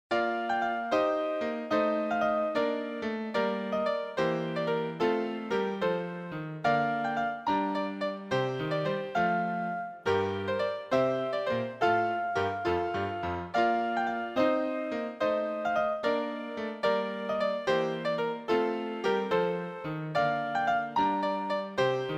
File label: minuet and musette; This is another composition in Baroque style, similar to "Allemande" from this collection.